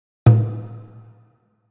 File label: Perc